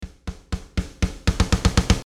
Velocity buildup, a drum part that uses a velocity build-up; Here is a drum part that uses a velocity build-up.; MIDI velocity